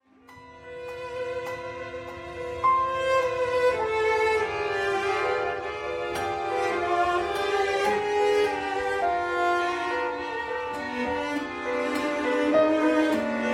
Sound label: Cello